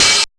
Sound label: INDY OHH, LOFI HATS